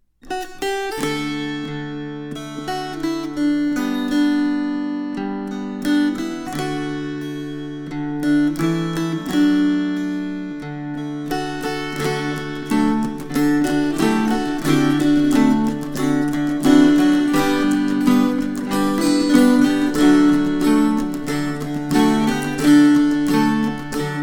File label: String Choir